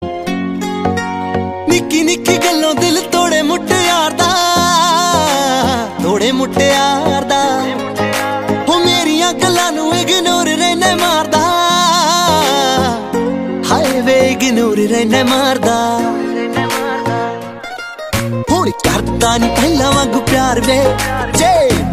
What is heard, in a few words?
Punjabi ringtone